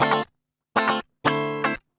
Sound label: GUI 120 BPM